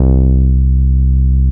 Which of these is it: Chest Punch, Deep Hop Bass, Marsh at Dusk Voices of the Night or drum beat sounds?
Deep Hop Bass